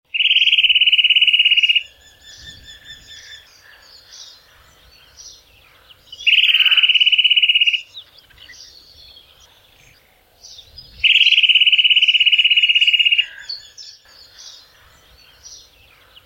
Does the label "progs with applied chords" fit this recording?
No